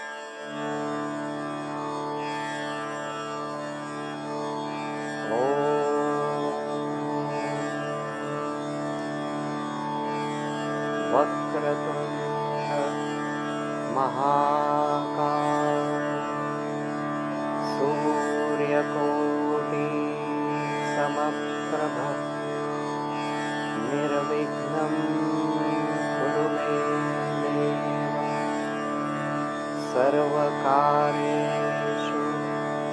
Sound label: Ganesha Chants